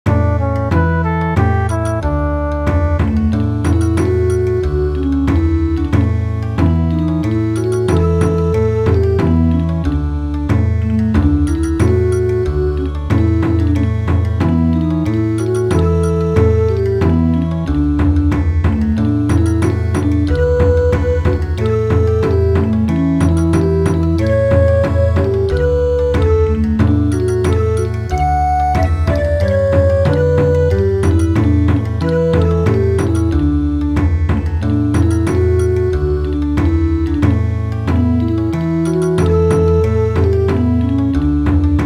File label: Bon Odori, midi; Abschied Von Den Bergen